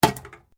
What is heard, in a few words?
Metal Light Movement Minor